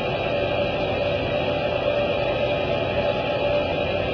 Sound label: cockpit interior rumble, aircraft